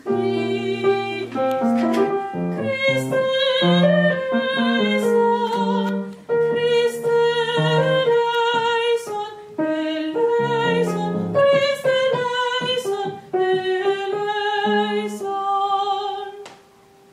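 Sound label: soprano; christe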